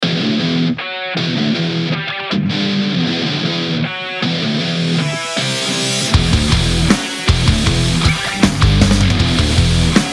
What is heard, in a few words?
motivation gym hard advertising rock; cfo event 2026